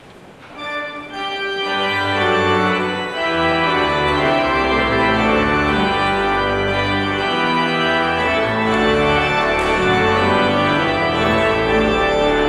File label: Postlude